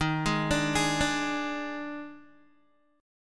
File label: Eb Major 7th Suspended 4th Eb, Guitar; arpeggiated; 11,11,12,13,14,x; EbM7sus4